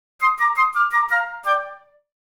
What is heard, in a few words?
penumbra flute